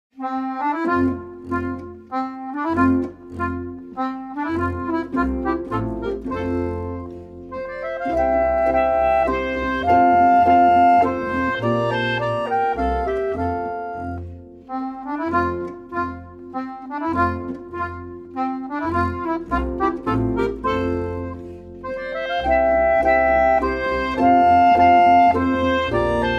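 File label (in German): Holzbläserquartett Deutscher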